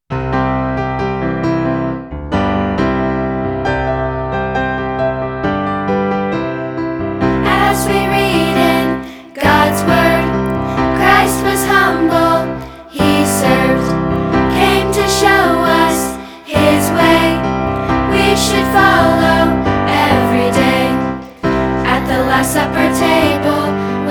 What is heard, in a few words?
Piano